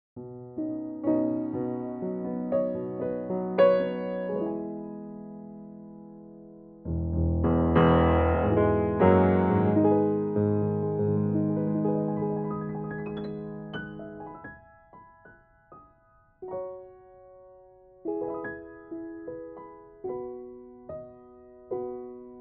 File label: piano sample